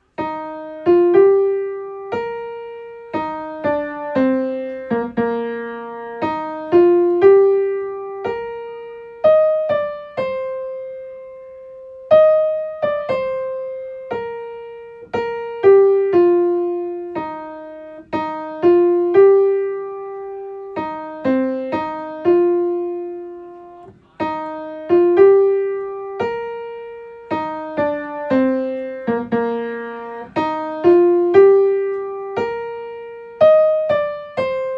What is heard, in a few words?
Soprano Accompaniment Track